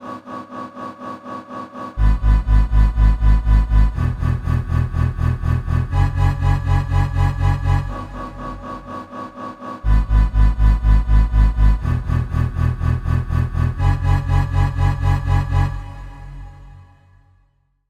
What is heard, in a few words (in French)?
Ambient boucle